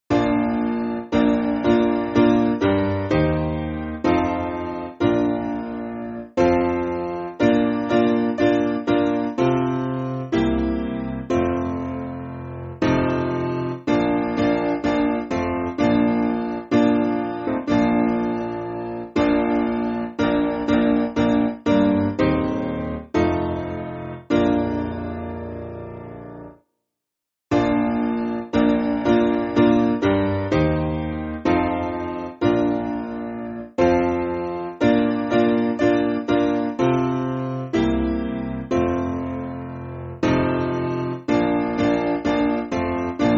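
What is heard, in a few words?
Piano, Music Backing Files for Churches; Woodbury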